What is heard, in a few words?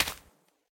footsteps moss; Fate The Cursed King